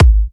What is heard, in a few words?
G#, kicks; markus hakala kicks